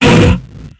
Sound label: frost explode